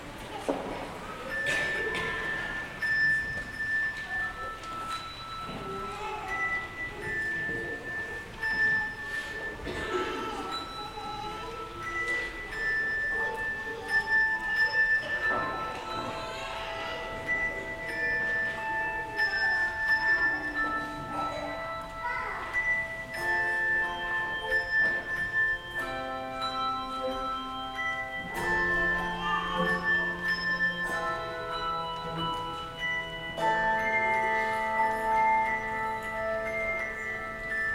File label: Handbells Ostinato in A minor